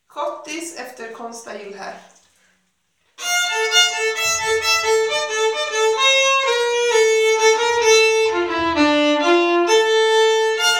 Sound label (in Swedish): Schottis; efter Konsta Jylhä